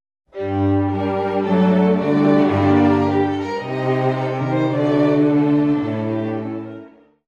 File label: cadences